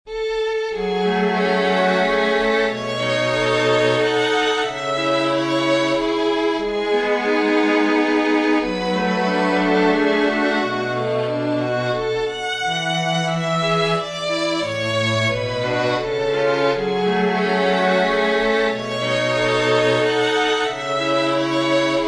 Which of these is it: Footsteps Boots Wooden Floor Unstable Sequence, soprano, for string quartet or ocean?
for string quartet